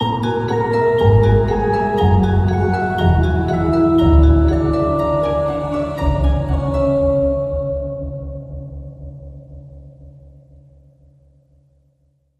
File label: Sinking